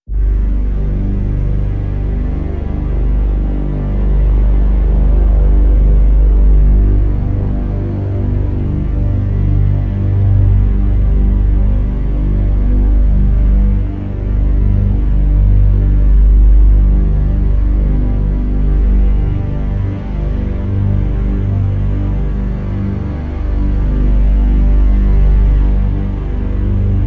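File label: Drn G, drone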